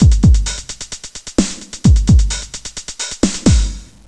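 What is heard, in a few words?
drum loop; Descent II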